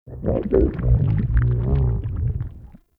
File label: Stomach Grumble E; Warframe; Grendel